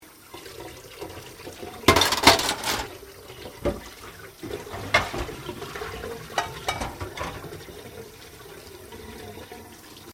Washing Dishes Running Water; Household Appliances; Appliances (Sound Effects:Household)

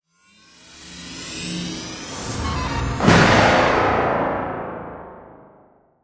Weapon Charge Up, Scifi, Blast, Science Fiction; Battle

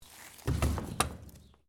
debris

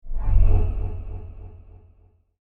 Deep Electric Whoosh Short

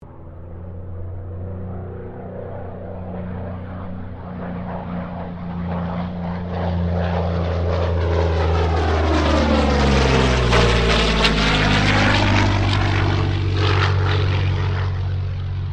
AIRCRAFT P-40 KITTYHAWK PASS STEREO

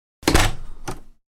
Door Slam, Slamming a door shut; Miscellaneous Doors

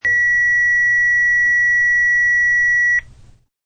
Fax Machine Beep, The beep of a fax machine; Fax Machines (Sound Effects:Electronics)

